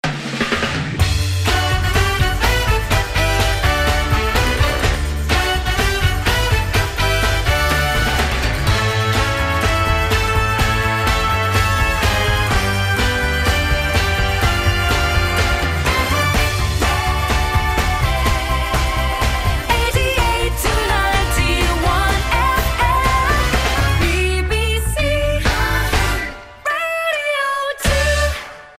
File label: Radio Hits, Radio style ads intros sweeps & jingles